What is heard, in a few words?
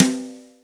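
Brush Hit, Yamaha DTXpress Electric Snare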